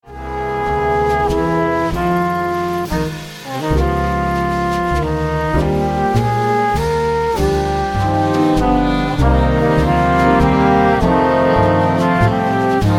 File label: Waltz, musical theater